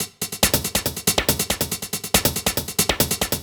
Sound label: Hh Loop, Drum Loops 140bpm; Zero-G Trance Inducer